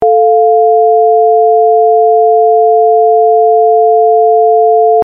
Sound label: sine test